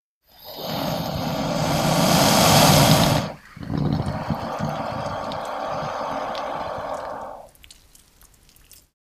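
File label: Alligator Eating Breath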